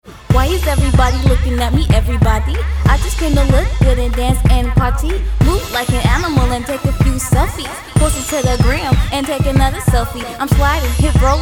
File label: HIP HOP SAMPLE